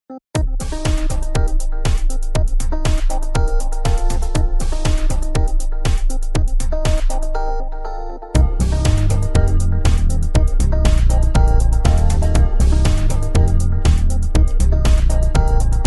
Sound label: jazz